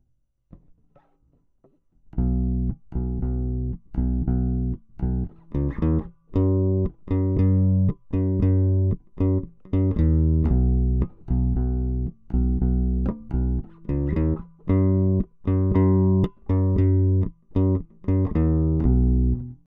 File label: Hi-Fi DI